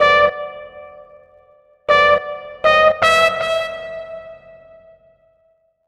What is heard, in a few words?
Trumpet